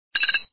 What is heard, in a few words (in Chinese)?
叮; 创维移动+vika 音乐与声效